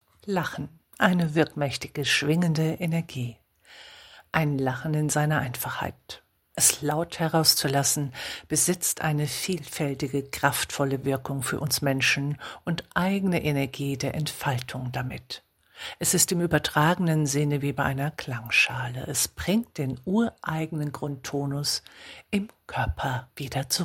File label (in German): Lachen; Energie des Lachens